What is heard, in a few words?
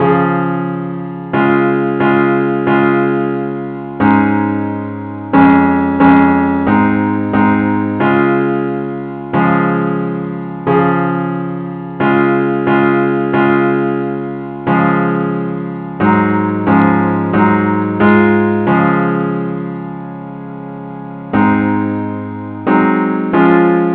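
parts only key C sharp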